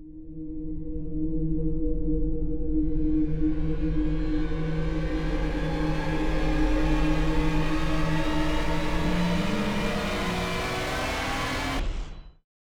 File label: Stinger; Descendant